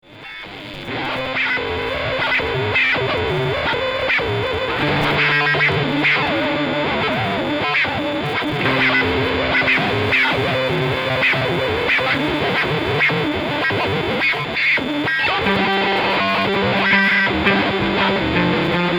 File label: bass